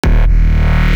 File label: E Bass Shot; neuro; BIG5AUDIO